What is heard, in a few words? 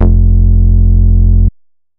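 bass sustain length F; Polyend; ARC Noise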